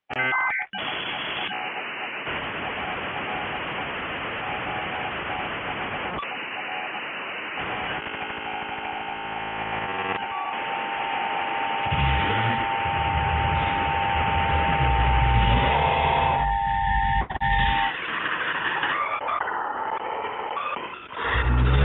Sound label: digital transition phone; Local58